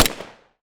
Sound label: body pistol shot; weapons; Half Life Alyx